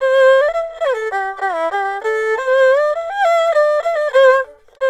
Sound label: ERHU; INIS; Opium